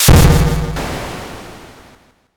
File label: thunder magic